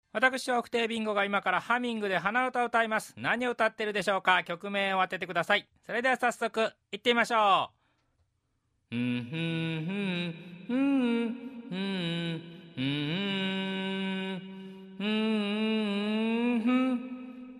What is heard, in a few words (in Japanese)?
hanauta